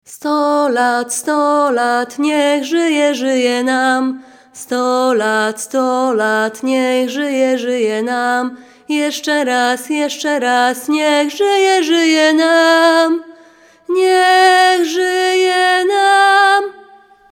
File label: happy birthday, feestliedjes; verjaardagsliedje uit Polen